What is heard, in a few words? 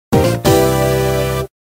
Fanfares; Typing Adventure